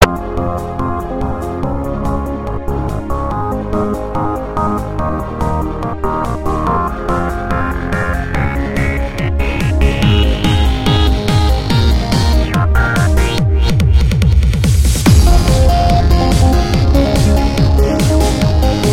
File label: Progressive